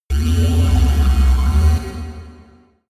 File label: space alien construction machine; aliens; soundpool